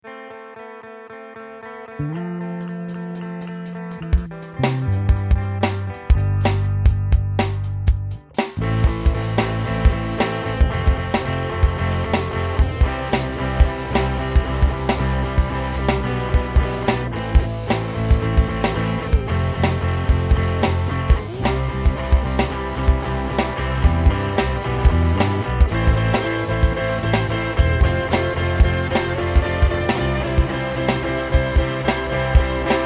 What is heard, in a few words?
4/4 rock